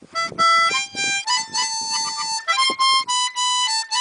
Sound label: Harmonica